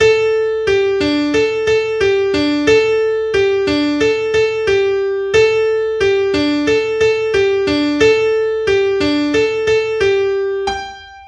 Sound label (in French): Dansons la capucine musique traditionnelle